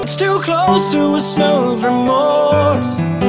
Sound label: Purple notification